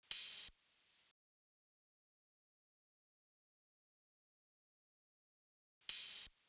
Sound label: Crunch Drum Loop 83 BPM Open Hat, Lofi; Cymatics; ANALOG EVOLUTION Beta